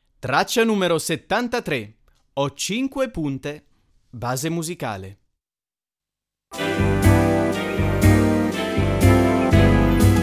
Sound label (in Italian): base musicale; infanzia